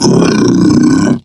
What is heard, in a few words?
sleep idle